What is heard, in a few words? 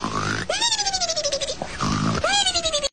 zzzzzzzz